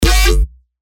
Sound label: bass shot G; Godly; revealed bass shots vol 3